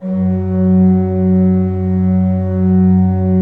16-8 F2, Cathedral Organ; Propeller Island

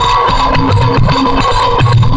JINGAL THUMP; Rarefaction; Poke in The Ear With A Sharp Stick

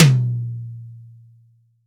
HTOM, STUDIO TOM

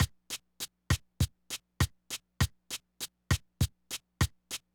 Loop, drumtraks

